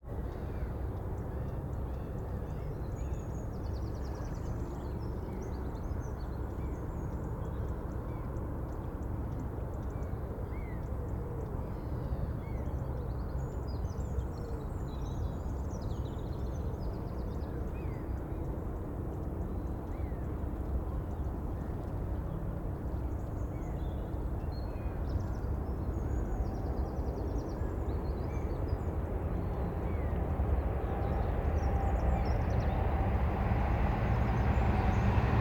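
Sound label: AMB COAST Waterfront Morning Calm; Coastal; Systematic-Sound; General Ambience Series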